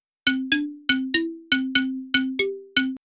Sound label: xylophone